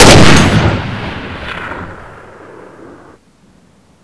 awp fire, weapons; aw50; gmod

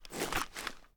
reload fast rotate, weapons; gmod; viper

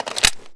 reload empty magin, weapons; gmod; viper

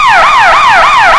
firetruck yelp; gmod; vcmod; els

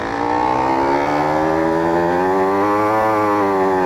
drive, peel; vehicles

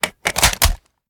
sm uzulu reload empty xxmag mag in; gmod; iw8

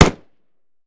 mike4 fire last, weapons; gmod; viper

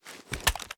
reload end; gmod; iw8; uzulu

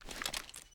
fast reload exmag raise; gmod

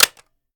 ar reload fast dmr mag in; weapons; gmod; viper; scharlie

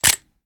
charge reload cylinder close, reloads; gmod

